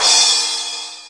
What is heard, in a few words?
80s CRASH, 80s Drum Machine; DRUM MACHINES